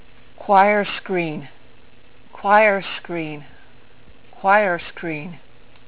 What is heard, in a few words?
choir screen